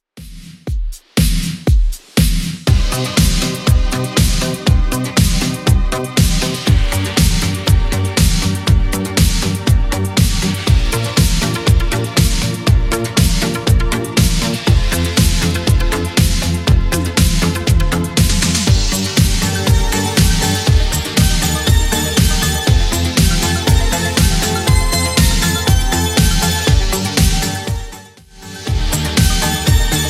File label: 80s Redrum 120bpm